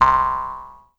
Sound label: Perc Jaws Harp Dah; Captainsiberia; pitchglitch